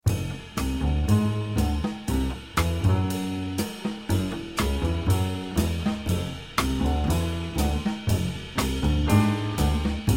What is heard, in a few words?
minus Melody